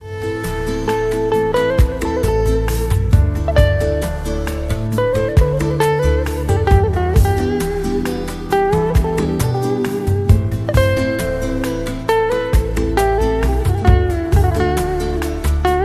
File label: nice music ringtone, turkish; android